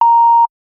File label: Beep Long